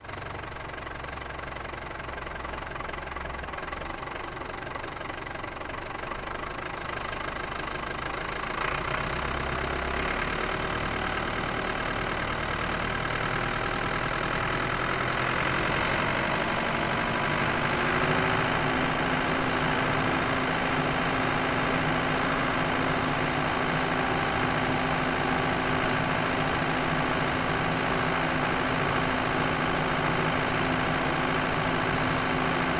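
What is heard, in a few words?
ford tractor onboard medium drive various engine right; mkh8020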